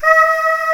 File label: FAH A4; East West; Global Sounds